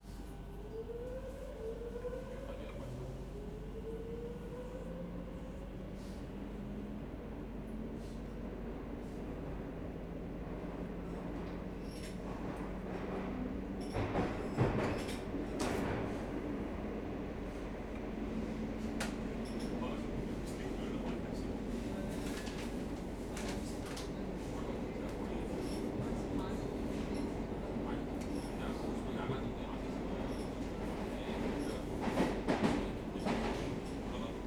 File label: metro train madrid busy; INT; 3maze